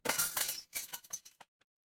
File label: impact crash